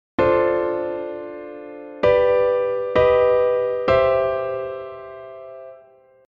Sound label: Dm7 G Gaug C